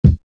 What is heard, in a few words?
Kick